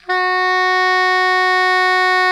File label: SOPRANO F#3, Saxophones; Greytsounds; Philip Wolfe David Crozier's Saxophones